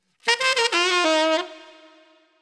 Tiny Riff 8 Beat Dm 90

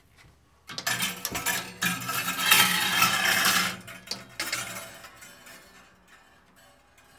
Cabinet Hangers

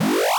8 Bit Alarm

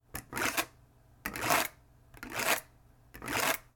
Swiping Atm Card

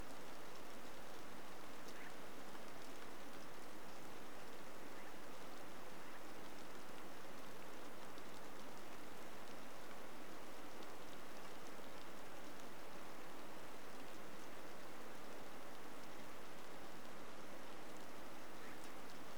Mountain Rainy Day Roomtone

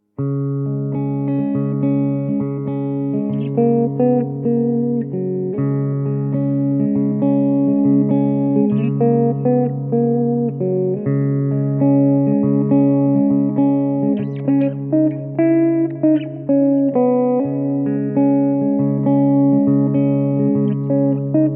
Electric Guitar Melody

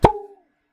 Balloon Ploink

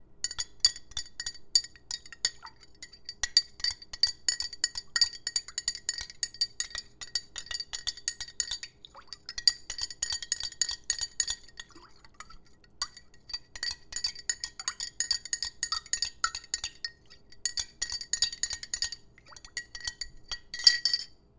Water Stirred In Glass With Metal Spoon

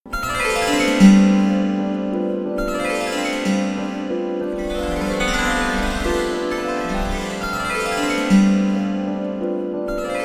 Magic Intro

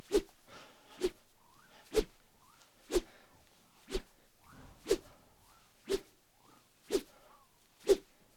Fighting Whoosh

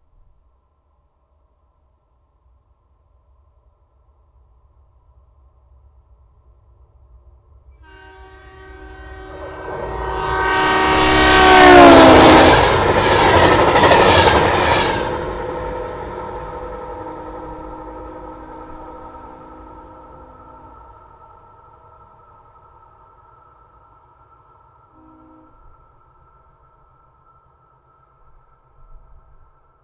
Diesel Passenger Train

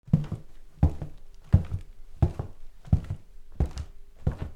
Footsteps

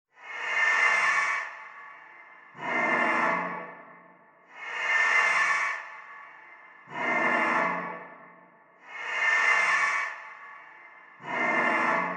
Terror Machine

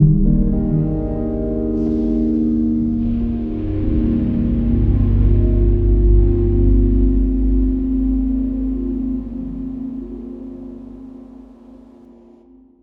Piano Note Ambiance

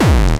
Hardcore Kick

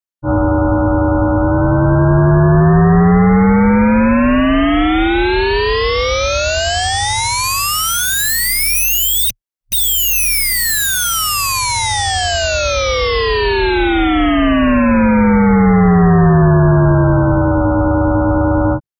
A Weighted Stereotypical UFO

